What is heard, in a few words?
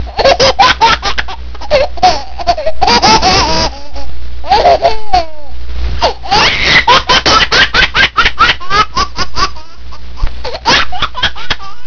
baby laugh